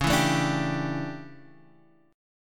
D Major 7th Suspended 2nd Suspended 4th C sharp, chord